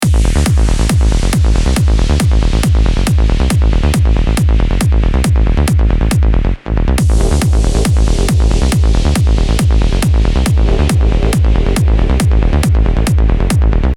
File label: Tech Trance kick bass; Allan Morrow; Tech Trance Tutorial